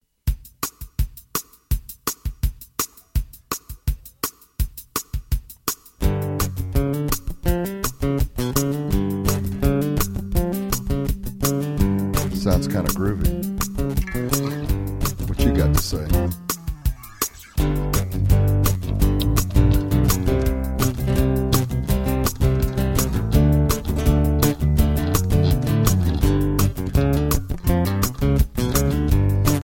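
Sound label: instrumental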